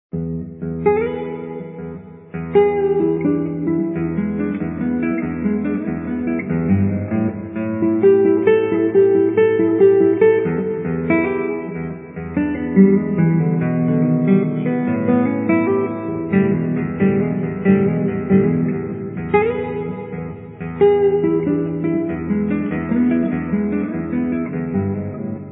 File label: blues